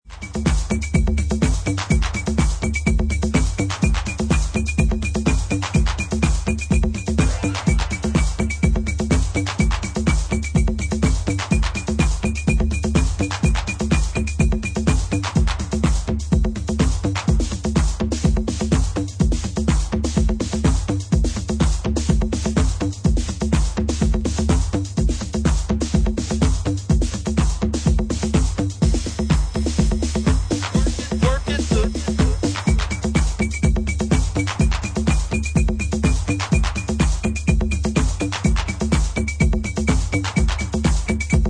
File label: Instrumental